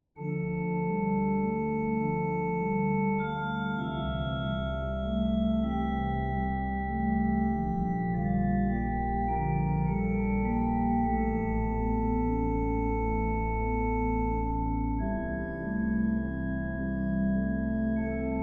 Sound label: meditation on the ukrainian folk song, organist; my dear mother